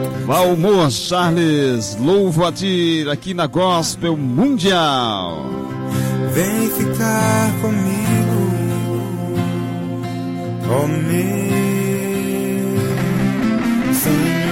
louvo a ti vinheta

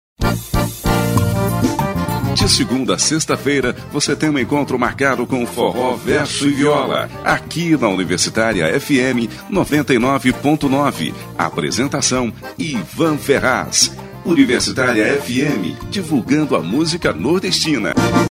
forra verso viola

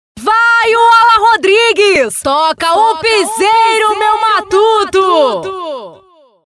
toca o piseiro matuto

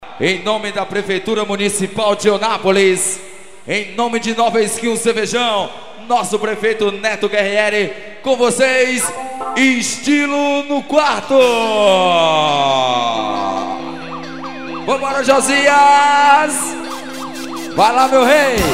ao vivo abertura

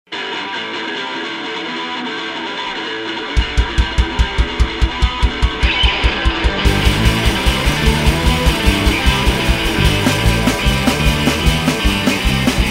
vinheta

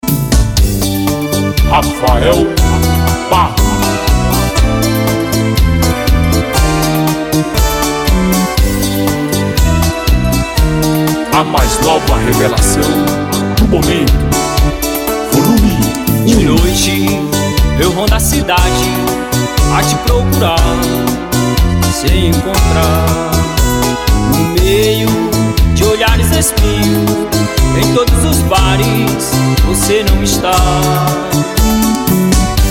bolero ao vivo

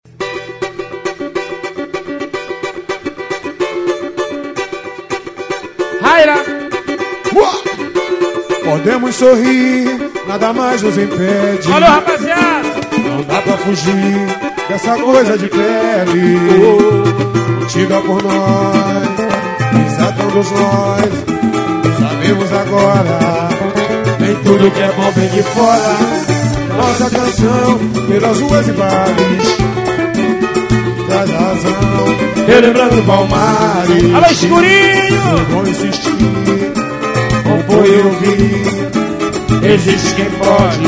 samba

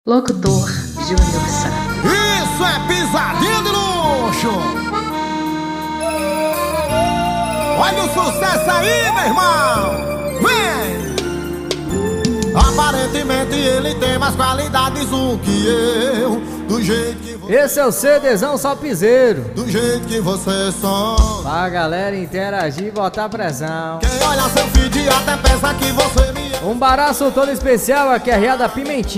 piseiro

